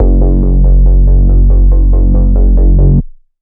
synbass; multisampled; synth keys

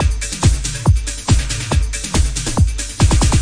140 electro kick 4-4 tekno, DRUM LOOPS and BREAKS

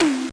Commodore 64 SID, 8bit SID Drums; LO FI and 8 BIT KITS

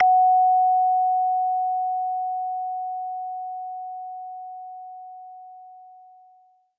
bell instrument note; Multisampled Bell Instrument

